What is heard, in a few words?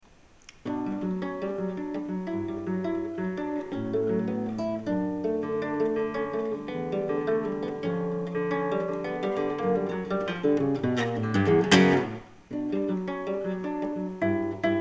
rumba, guitar